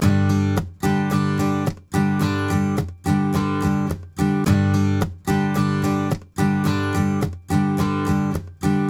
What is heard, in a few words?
Blues Guitar Samples, RIFF; ILIO; Fretworks